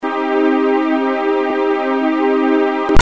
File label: Chord